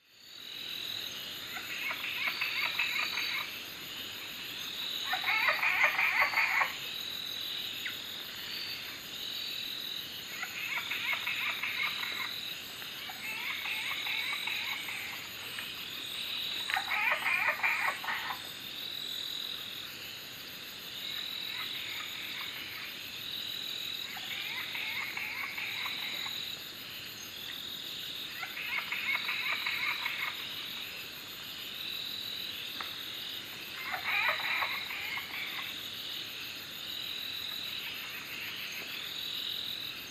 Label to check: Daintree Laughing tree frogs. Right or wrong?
Right